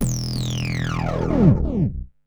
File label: Virus Drop